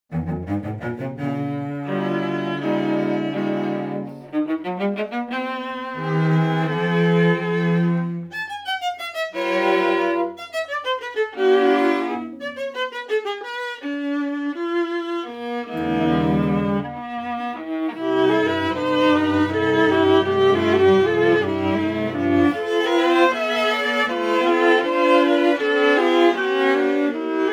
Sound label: Trio dry